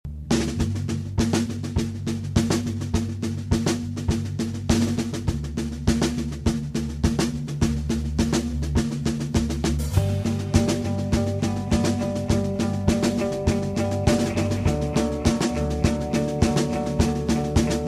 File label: drum